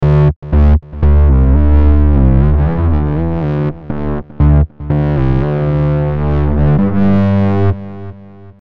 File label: Bass